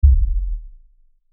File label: LFE Hit, Lfe Hit Short Impact; Hit Spinner Sputter Production Element Imaging Element Accent Transition